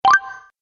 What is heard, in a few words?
Positive Win Mini Bonus, Video Game Sound Effects